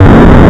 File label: signal